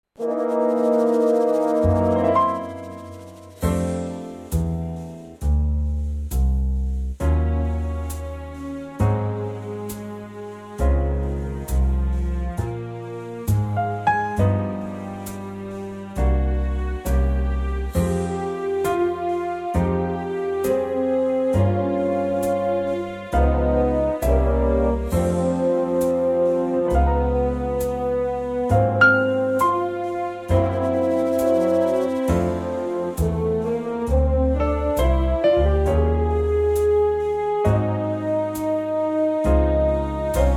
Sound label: F